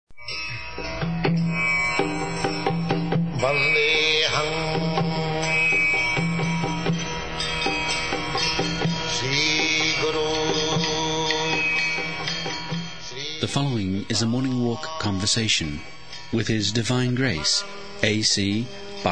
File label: Chant